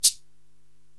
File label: Cabasa-Down; Korg TR-Rack